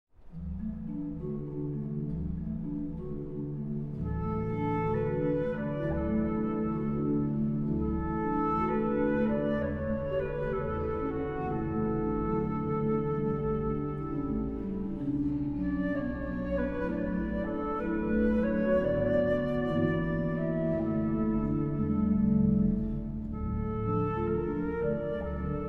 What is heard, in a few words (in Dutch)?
Romance (2 orgels en fluit); Psalm 73